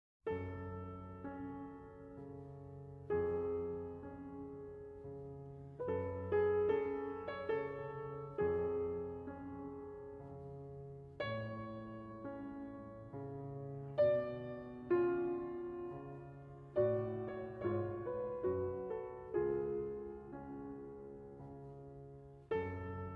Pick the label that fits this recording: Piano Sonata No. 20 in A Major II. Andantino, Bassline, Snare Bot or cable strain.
Piano Sonata No. 20 in A Major II. Andantino